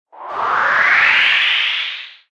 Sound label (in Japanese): fuyuuon